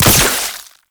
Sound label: Flechette Proj Explosion; Weapons; Warframe; Tenno; Oraxia